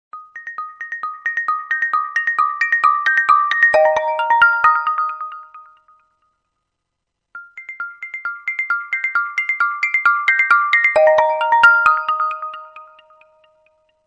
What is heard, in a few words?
nokia 6 curve ringtone